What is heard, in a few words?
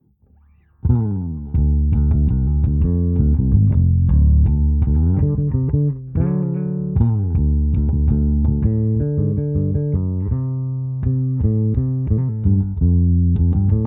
Flying V no horn